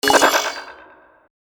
Digital system error

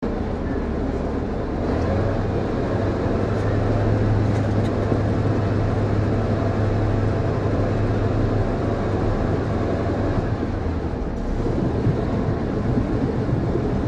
Tank moving interior perspective

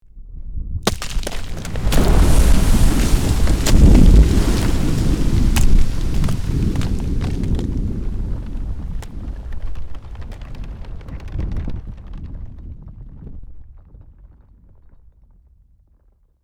Volcano lava eruption explosion debris; nature

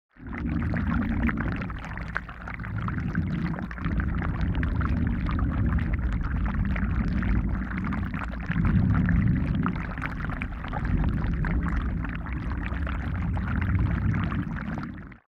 Water flowing underwater perspective

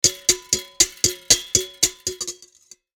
Steel whisk whisking action; cooking

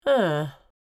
Female mature woman disappointed moan

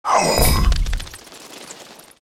Vampire transformation bats morph

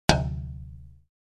Window being hit by random object

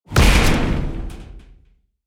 Tank armor hit by enemy fire

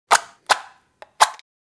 Match strikes no ignition

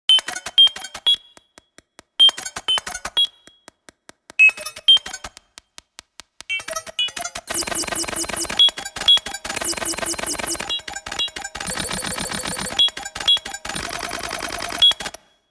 Futuristic data calculations long

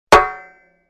Metal hit impact hollow

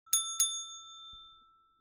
Hotel desk bell ringing soft gentle

